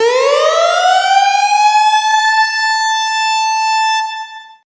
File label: siren